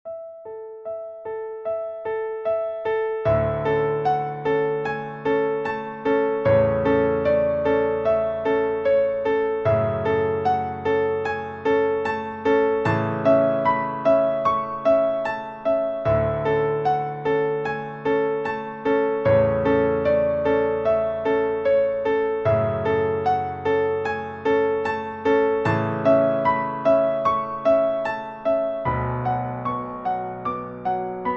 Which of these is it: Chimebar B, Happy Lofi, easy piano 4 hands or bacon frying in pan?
easy piano 4 hands